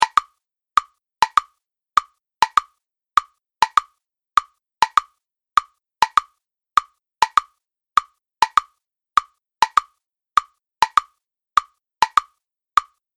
Classic Timer Seamless Loop, beep sound effects

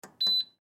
Dryer Push Button, Dryer Push Button Beep; Frigidaire Appliance; Household; Blastwave

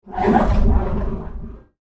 Alien Space Machinery

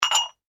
household glass ramekins x2 clink; Clink Together; Objects; McKinney Sound

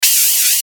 static hiss; Static Hiss Spinner; Production Element Imaging Element Accent Transition; Blastwave; Static Sound Effects

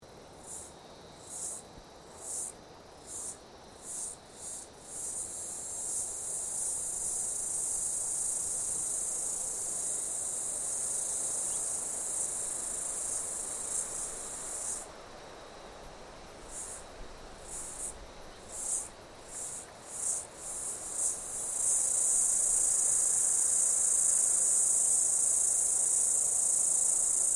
Insects Cicadas Australian Call; Various Australian insects; Animals:Insects

